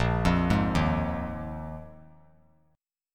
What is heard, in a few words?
Bb 7th Suspended 4th Bb 3C,3F,3A,3D; arpeggiated; JGuitar